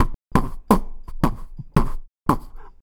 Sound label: Beat Box; Beat Components